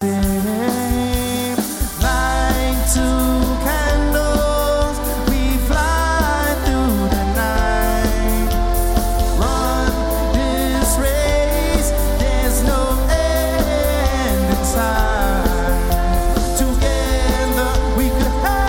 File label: BeatBox